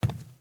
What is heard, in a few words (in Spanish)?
Paso zapato madera